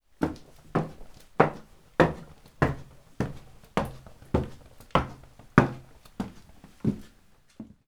Footfalls stairs wood; footsteps

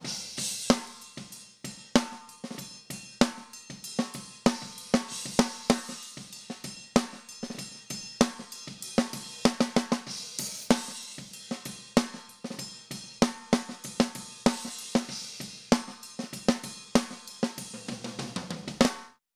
Snare

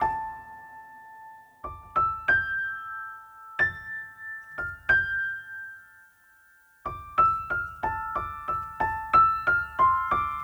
92 Dm piano melody; Ambient Piano Loops; C and Dm Night Mood

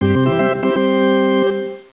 baseball organ

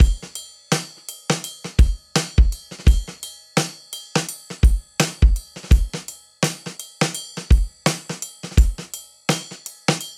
84 Acoustic Drums, Live Trip Hop Drums; Loops; Smoke Signal

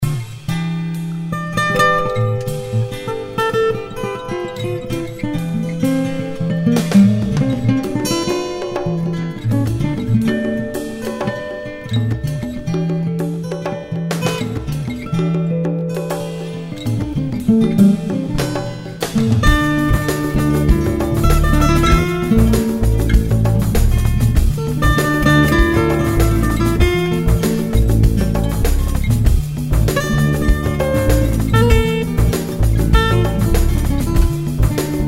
Music background pads

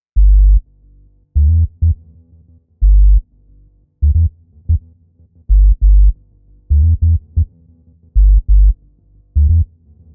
E Sub Bass; Drums- Downtempo Loops & Samples; RootOfUnity

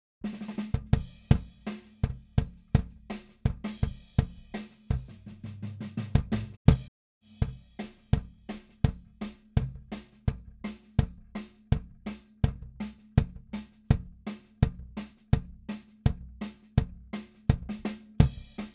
Kick; BMW Blues EWU

